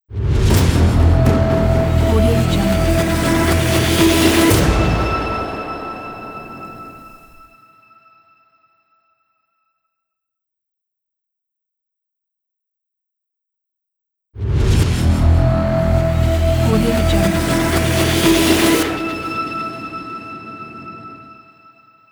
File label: ignition cinematic audio logo